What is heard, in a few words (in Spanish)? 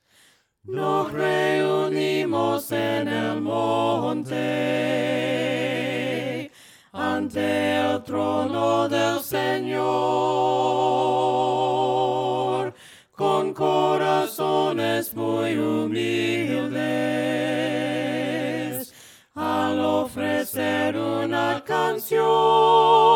VOCES, himnos a capella; cantos del camino; NOS REUNIMOS